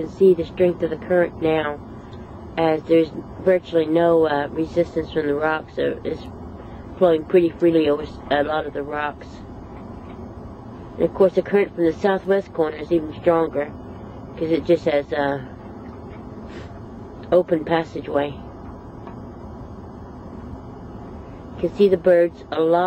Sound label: jetty